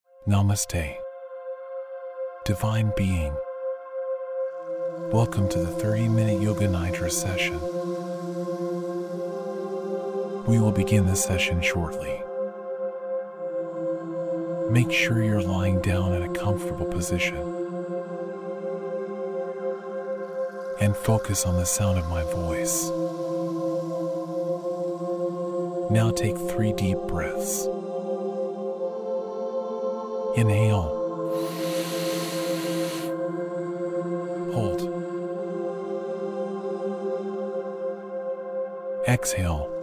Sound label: 528hz Love and Miracle Masculine